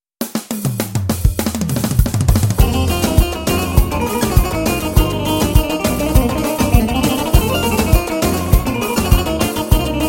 kesik cayir; yamaha tyros3

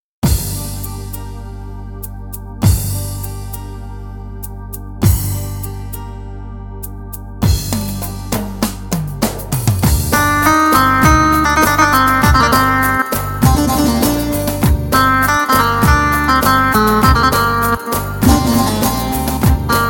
a2000 baglama; saz

